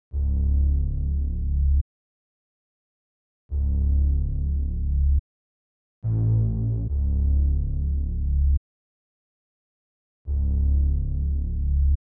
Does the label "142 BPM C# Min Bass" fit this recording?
Yes